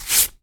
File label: PAPER, TEARING PAPER